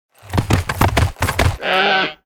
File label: Ram Mount Play Underlay